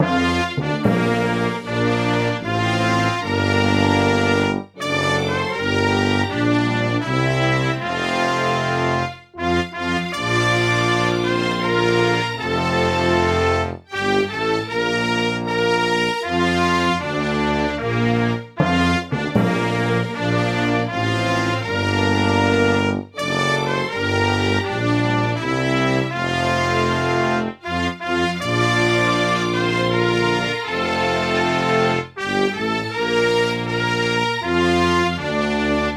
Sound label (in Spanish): Himno Nacional De Estados Unidos